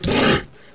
zombie attack; ca zombie; svencoop

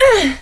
grunt; kingpin; female; fighting

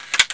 mag in, weapons

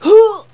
FEMALE DEATH; combatarms2

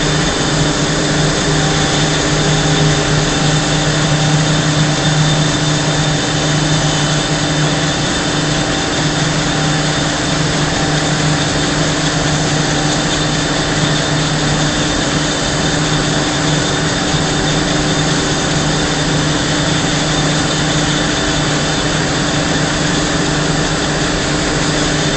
dropship landed; antares; svencoop addon

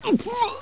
die; barney

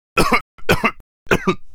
COUGH MALE; combatarms

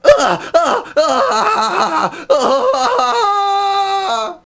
fire death

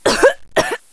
COUGH FEMALE; combatarms2

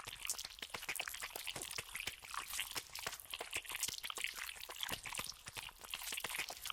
slime loop; dn3d r

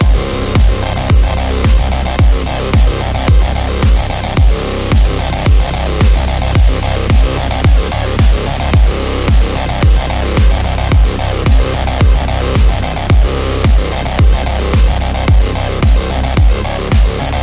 music; crimecity

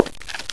step; kingpin; steps

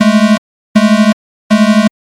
alarm autoclave; antares; svencoop addon

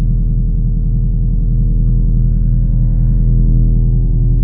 forcefield hum; dn3d r

